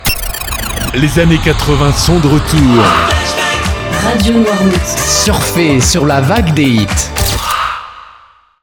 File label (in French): JINGLE LES ANNEES 80